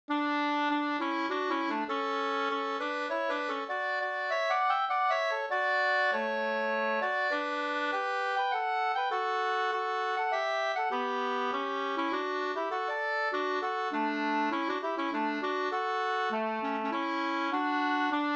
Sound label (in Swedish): menuett, 3st; Melevetten SvL Sk168